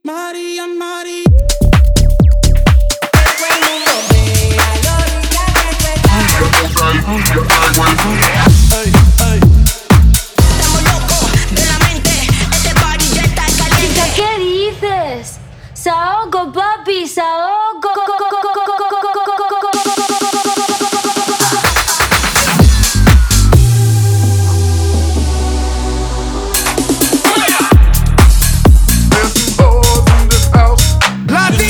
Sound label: Latino Tech House